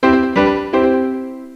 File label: piano iir